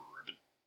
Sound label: ribbit, frog sounds